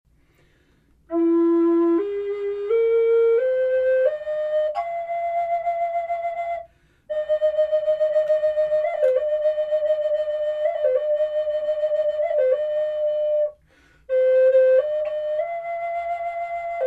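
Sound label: natural, flute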